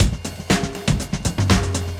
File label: breaks, 120bpm; free bespoke breaks; BeatStyleG